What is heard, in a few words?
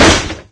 wood box impact hard, physics